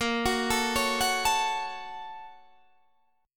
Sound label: Bb Minor Major 7th Sharp 5th, Guitar, Open D